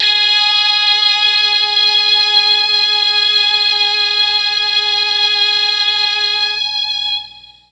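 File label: G#3 Strings Brass, Mellotron; Mike Pinder - Mellotron Archive CD; STRGS+BRASS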